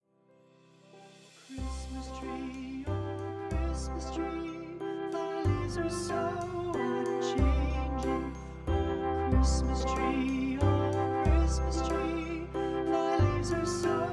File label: O Christmas Tree Vocals